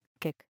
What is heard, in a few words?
kick